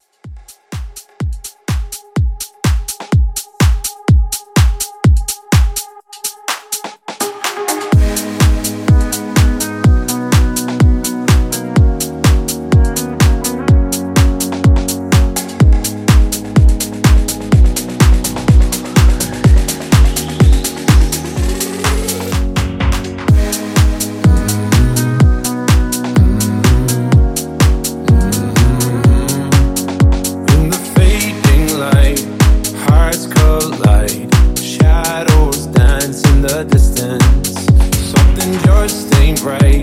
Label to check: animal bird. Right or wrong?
Wrong